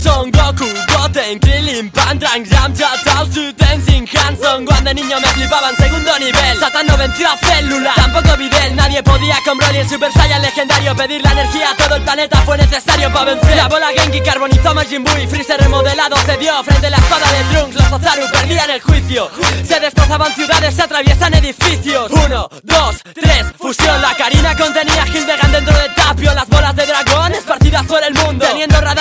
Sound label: rap ringtone; dragon ball